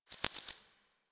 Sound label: Cricket